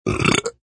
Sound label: Burp Classic, Burp, growling, throaty; Burping Sound Effects; (human voice)